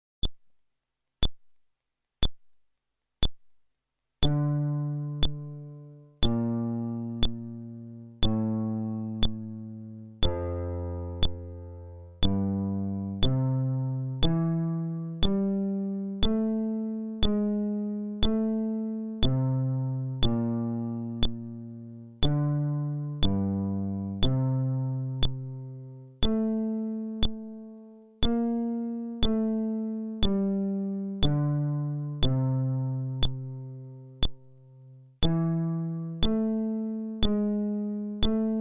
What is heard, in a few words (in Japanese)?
フレットレス